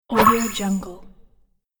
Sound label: whoosh pickup bonus